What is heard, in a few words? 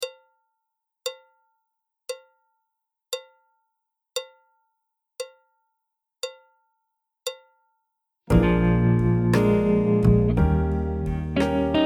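backing track, Jazz; clarinet, flute, trumpet; Jazz Syllabus Level 2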